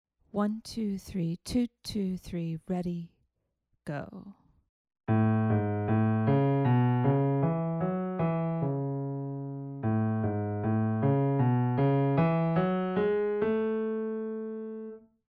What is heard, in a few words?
melodic dictation